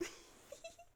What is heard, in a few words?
giggle; SDIF; ICMC2000